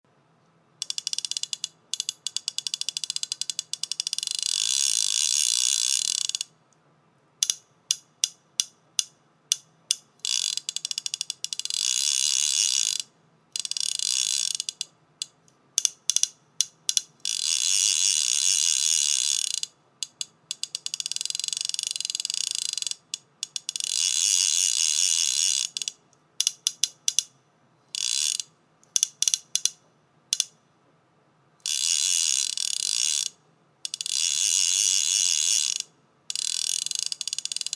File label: click